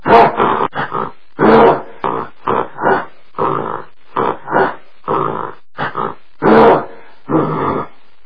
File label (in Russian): zvuki kotorie izdaet gorilla kogda igraet